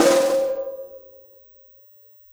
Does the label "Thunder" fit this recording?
No